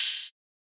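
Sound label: open hi-hat, Drums; One-Shots; HiHats; Ghosthack; Color Bass Essentials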